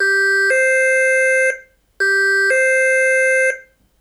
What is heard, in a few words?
Austria Rescue; Tonfolgen Hella; Dachbalkensystem RTK 7 Konfigurator